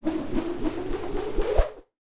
Lasso Rope Being Spun Spinning Through Air Then Throw